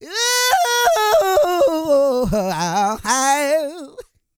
SING, Vocal Planet R&B & Dance; ILIO

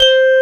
Korg CX3, C 1

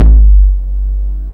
KICK -L, Disco House; USB Soundscan vol.20 - Fresh Disco House I

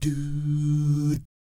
DOO SFZ, Jazz FX; Spectrasonics; Vocal Planet; JAZZCOMBO

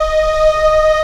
G#3, ANGELIC STR, Strings; AKAI S6000 CD-ROM - Volume 1

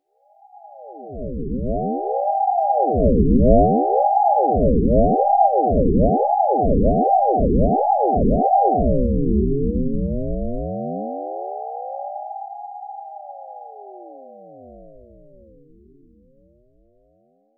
Big Red Sweep; Sonic Foundry (Sony Creative Software); Underground UK House & Electro

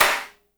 HAT SNR; Spectrasonics; Burning Grooves

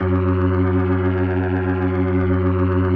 F#2F, B3, Vintage Keyboards

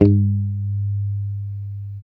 A3 sus, Strat guitar; Spectrasonics; Hans Zimmer Guitars VOL-1; JC-STRAT MTE

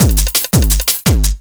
Change Break, breaks; m8 breaks

